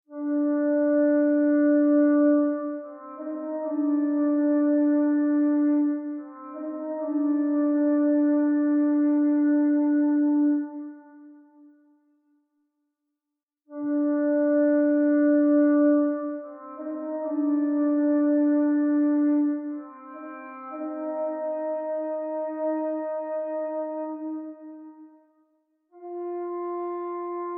Aquilonian Flute; gamerip; Age of Conan Hyborian Adventures

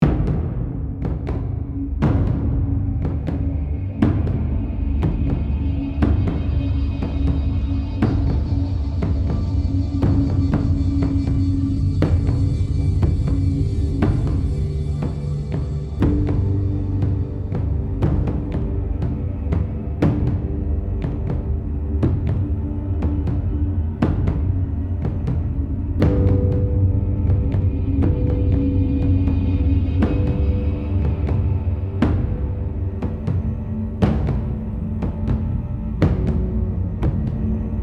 Tense loop

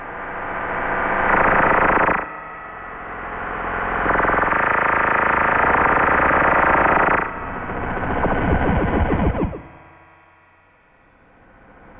brunt

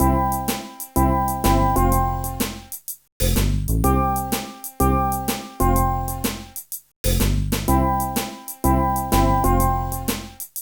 Music Mini Game; Little Wingels; NDS

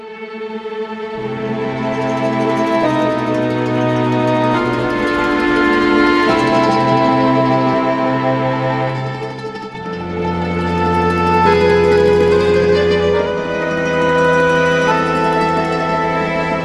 Suspense Intro; Shantae and the Pirate's Curse; Riskyboots

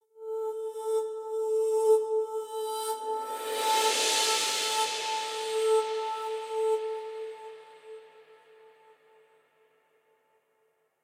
Guitartheme; Terra Invicta

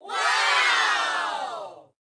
Ambient Sound; pangya fantasy golf

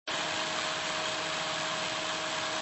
blender running; gamerip; Papa's Freezeria

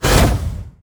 Teleport Light

